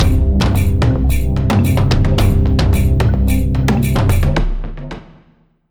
basicMood Cueva Bosque

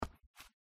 Tile step; The Joy of Creation Halloween

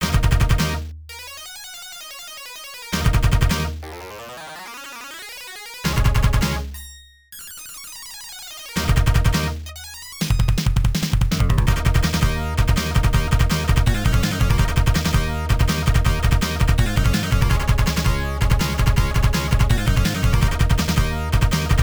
Jungle Combat; dungeons dragons warriors of the eternal sun; genesis

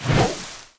Ui Whoosh; talking tom gold run

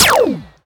lazer shot; birthday party bash; wii; laser gremlin

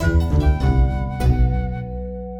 Combat Fail Stinger Music; Hellenica; macos windows gamerip 2017

